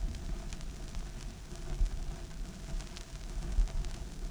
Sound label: Crackle, Vinyl Noise